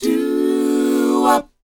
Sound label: DOWOP E; ILIO; Vocal Planet